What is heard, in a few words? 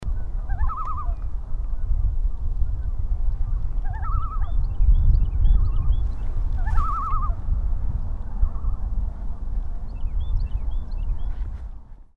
Common Loon; Assateague MD